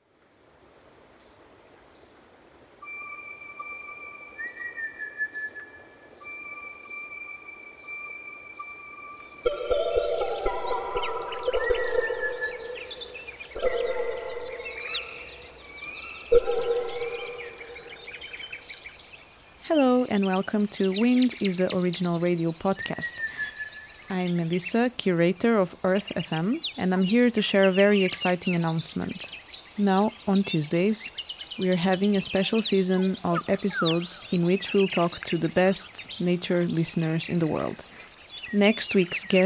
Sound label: Midday Chorus, Tanzania; Amani Forest; Martha; Wind Is the Original Radio Fridays